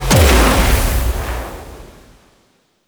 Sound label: Song Bubble Shockwave; Enemies; Abilities; Warframe; Teralyst; Bigalyst